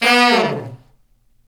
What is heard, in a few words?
BRS Fat Section, Fat Falls; Roland LCDP06